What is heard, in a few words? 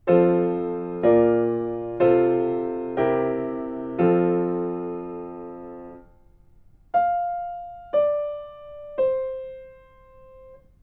melodic fragments; ear training